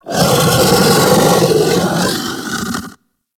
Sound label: Kubrow Tame Sleep Idle Yawn E; Warframe